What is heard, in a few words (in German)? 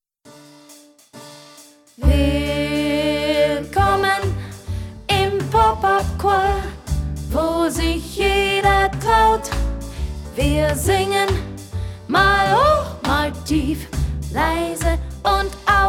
Sopran